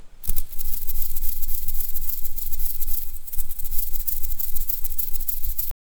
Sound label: flying butterfly wings, insects